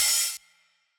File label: Open hat, retro house samples, Drum Hits